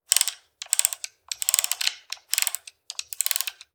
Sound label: Phoropter, Auxiliary lens dial, Top dial, Turning, Adjusting, Clicks, Fast, Short, Burst - Turning; Optometrist Equipment; SHhh! Listen SFX Libraries